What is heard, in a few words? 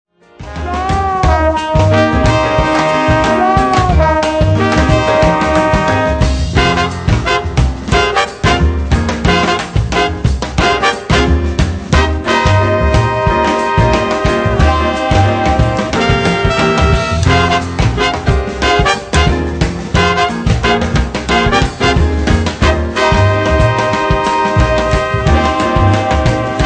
2nd line New Orleans